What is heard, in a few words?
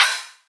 Dampened Open Hi Hat Sample G Key, Royality free open high-hat sample tuned to the G note.